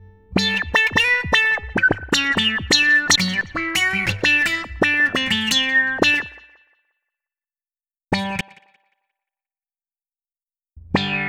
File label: Wah Wah Gtr